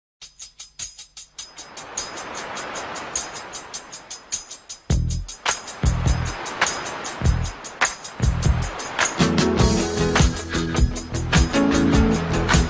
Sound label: Only Crying